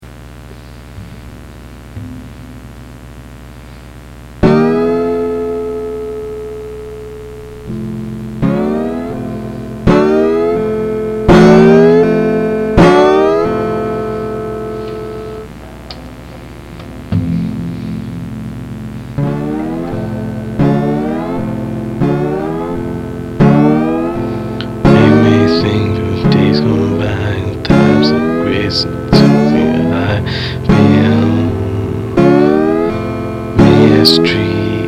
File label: late blues